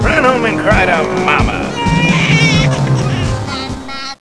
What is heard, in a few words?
lose; a10cuba